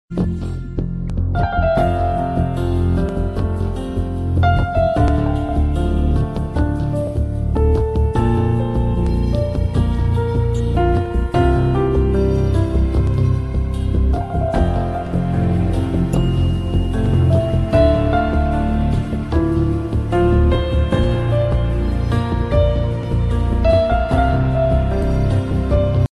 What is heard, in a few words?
soft ringtone